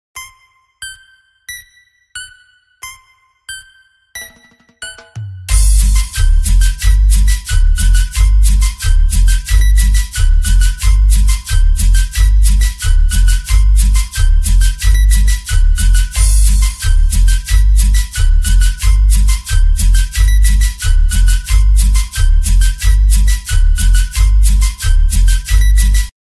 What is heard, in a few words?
Cumbia; Pokemon Lavanda